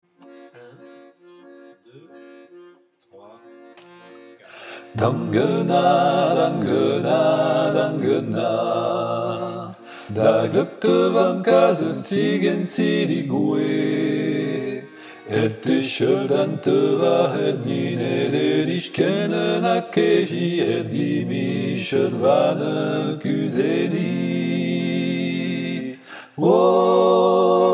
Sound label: Dangyna Tutti, chant; Orfées Productions